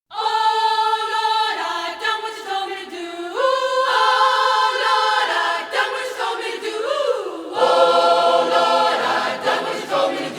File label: Gospel Choir